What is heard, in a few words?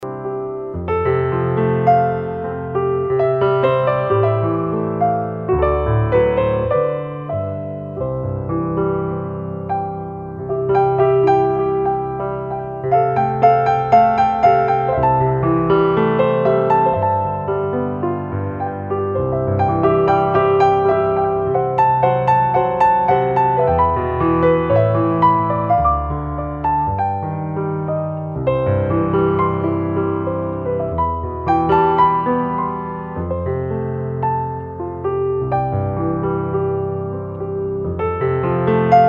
rainbow piano